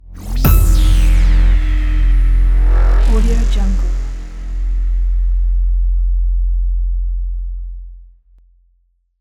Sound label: hybrid trailer cinematic tension hit